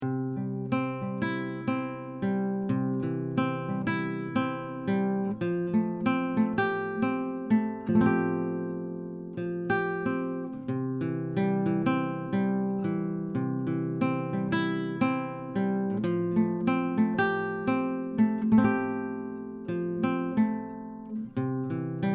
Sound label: solo karaoke